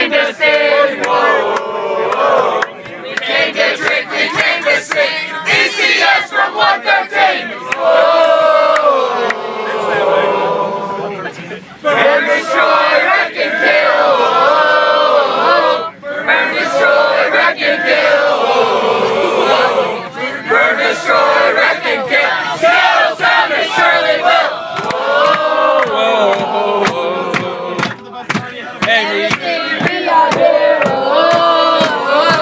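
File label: drunken bus singing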